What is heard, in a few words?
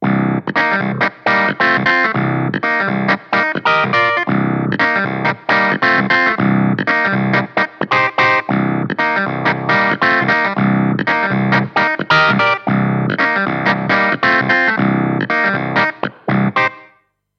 Clavi Touch Wah